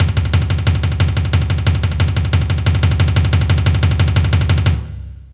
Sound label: Kick Loop, Drum & Bass; Construction Kit